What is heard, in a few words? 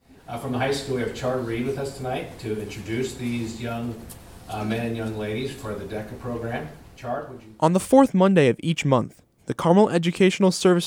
beat